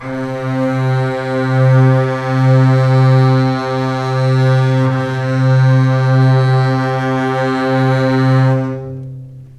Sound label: C3 LEG F, String; Ultimate String Collection